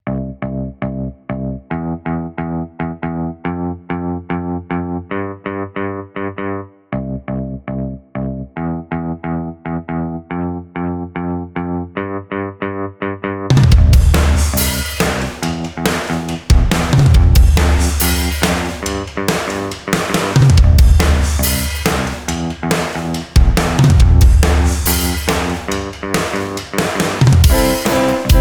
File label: Pop Rock